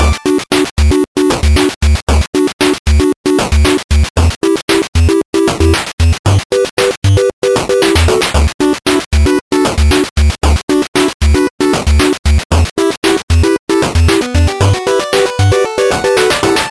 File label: music bg; EggboyJump